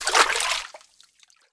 Water Walk

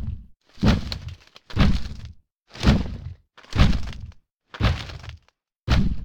Walk Loop; Urwin

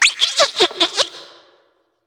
Monkey; moul

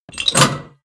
Shaft Lock

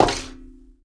On Stone Walk

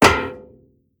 Metal Run

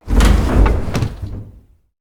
Hut Door Open Close

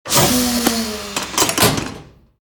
Book Put Back; moul-assets

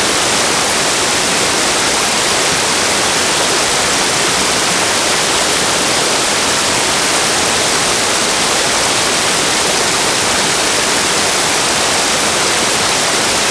Waterfall Loop; moul

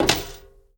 Maint On Stone Walk

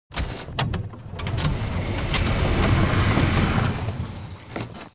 Fish Tank Open Close; moul-assets

